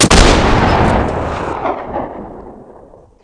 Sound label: deagle, weapons